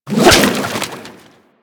Kraken Tentacle Whoosh; Warframe; Warframes; Pirate

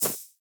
Generic Pickup Auto; Objects; Pickups

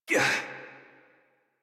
Pain Adult; Warframe; New War; Adult Operator

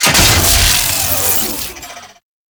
Lightning Deactivate; Grn Forest; Veilbreaker; Grineer Forest Thumper; Kahl

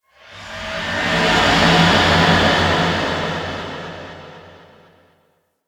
Rising Vocal; Props; Ropalolyst; Warframe; Ambience; Sentient Devourer

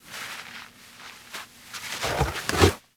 Foley Idle Back Rub Roll; Enemies; Kubrow; Warframe

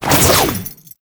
Ninja Teleport Jump Foley; Tau Dax

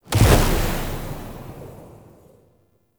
Energy Spike Cast; Powers

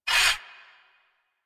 Cargo Train Exit Bolt Slide A; Warframe; Corpus Railjack

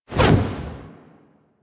Wall Latch End; Warframe; Tenno

